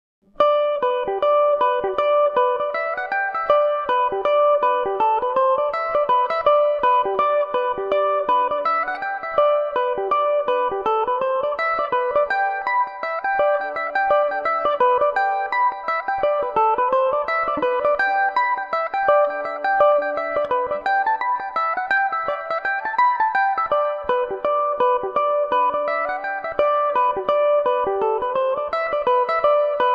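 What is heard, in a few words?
G major revelation, Irish mandolin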